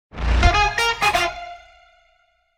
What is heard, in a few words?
Power On; Unefon